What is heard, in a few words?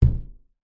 household window person knocking fist thud on window; zapsplat